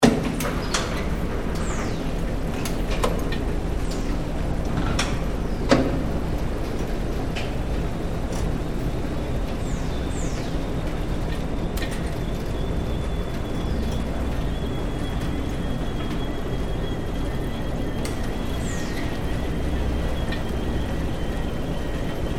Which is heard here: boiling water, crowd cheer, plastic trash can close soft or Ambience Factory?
Ambience Factory